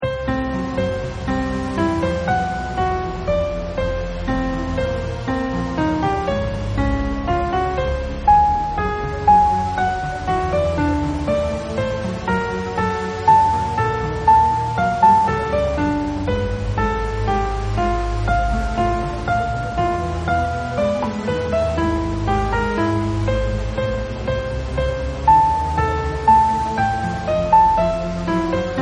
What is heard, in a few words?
fugue